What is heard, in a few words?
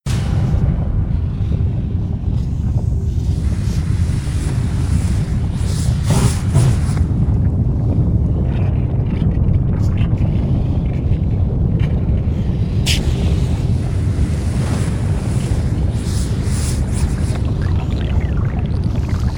Bubbling Lava, Ambient; rayman 2 remastered soundtrack